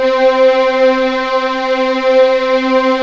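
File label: C4 legato forte, violins; East West; The Ultimate String Collection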